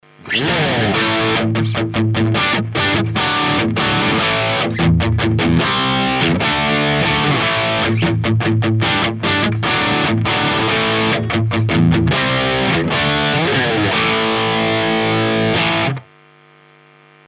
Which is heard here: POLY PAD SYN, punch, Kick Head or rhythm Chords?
rhythm Chords